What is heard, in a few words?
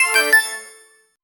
chest item reveal mobile, rpg